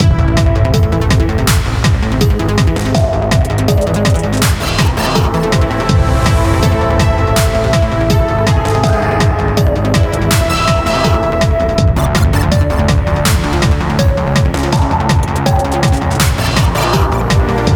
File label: Interface Menu Screen Intro; Lastfight